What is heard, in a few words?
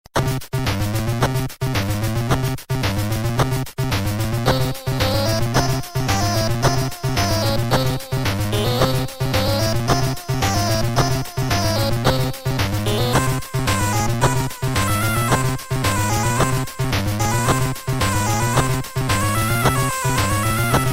Desert theme; DK'94